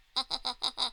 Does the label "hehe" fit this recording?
Yes